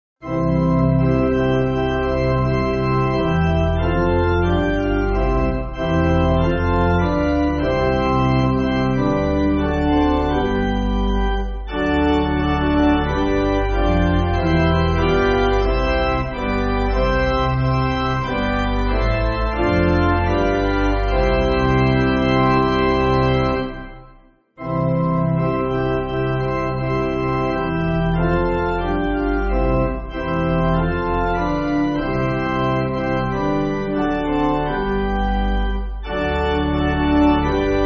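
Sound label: Pipe Organ, Backing Files for Churches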